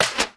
medieval crossbow bolt pass by; still north media

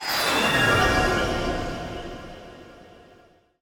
Transition Metallic; bottlerocket

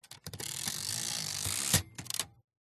typewriter carriage return movement; mkh8060; PMSFX

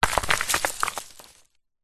foley stone drop ground light debris

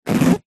rubber squeak; zapsplat

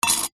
glass wine broken shard place into glass; household

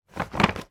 foley bottle wine remove from paper gift bag

